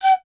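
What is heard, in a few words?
FLUTE PICCOLO F; Miroslav Vitous - Solo Instruments VOL-2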